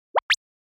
Motion Pop

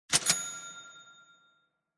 Cash Register Drawer

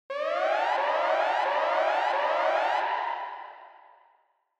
Warning Siren Reverb Low Mid

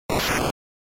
Magic Lightning, NES RPG

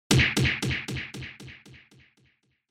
Hit

